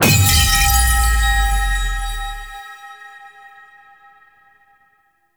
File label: Void Tear Open; Warframe; Cephalon Weave